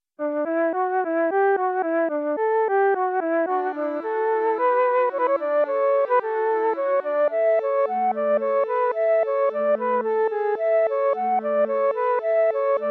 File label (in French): flute alto; bach johann sebastian; 15 inventions a 2 voix